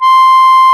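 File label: CONCERTINA; E-MU Formula 4000 Series Vol. 4; Earth Tones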